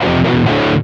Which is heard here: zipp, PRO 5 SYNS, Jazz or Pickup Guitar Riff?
Pickup Guitar Riff